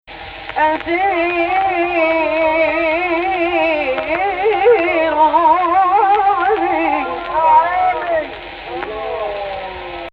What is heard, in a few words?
AHH, Egypt Rast